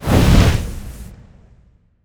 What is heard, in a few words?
single Stacked Sym; netent